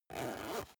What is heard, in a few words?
zipper use short